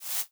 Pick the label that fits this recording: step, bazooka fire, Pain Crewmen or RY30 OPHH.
step